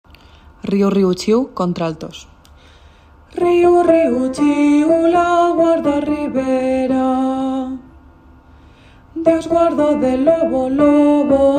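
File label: alto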